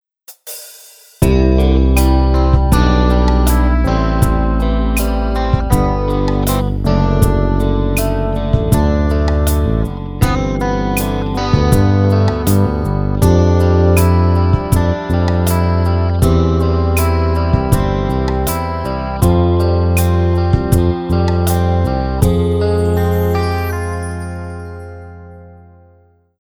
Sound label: patch demo